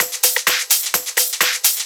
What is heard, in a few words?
Discohouse Loop 128 BPM